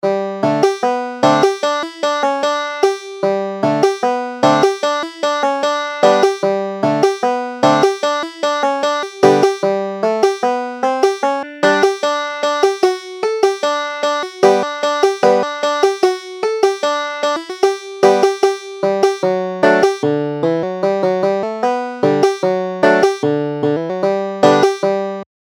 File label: kazatshak, banjo